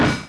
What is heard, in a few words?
player metal